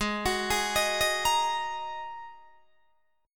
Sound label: G sharp 7th Suspended 2nd G sharp Guitar Open D